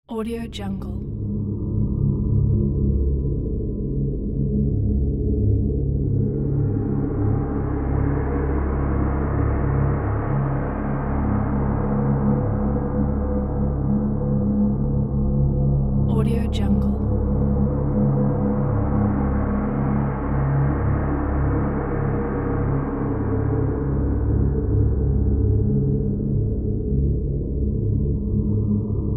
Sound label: gloomy drone ambience